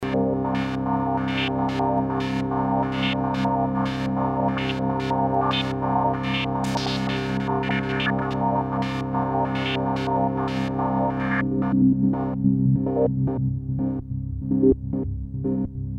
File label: Sequence 3 (formant); Red Sound Darkstar